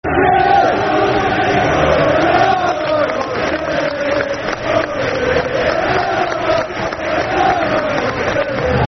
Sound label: R's Go Marching In, Football Chants (Soccer Songs); Queens Park Rangers Qpr